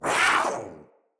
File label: Kavat Vocal Attack Short; Warframes; Khora